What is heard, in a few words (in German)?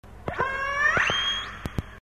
Schwertwal Ruf